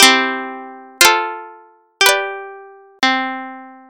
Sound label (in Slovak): Akordy C F G spolu